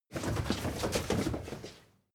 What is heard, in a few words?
crowd sit down